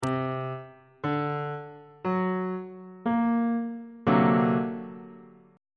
c double sharp augmented 7th 3rd inversion on bass clef